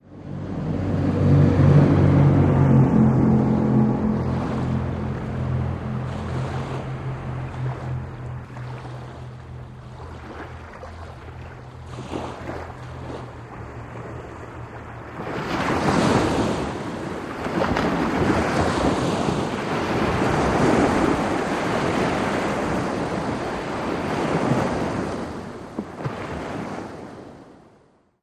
Military Pbr: By, Fast, Medium-distant. Wake Hits Shore. Recorded From Shore. Stereo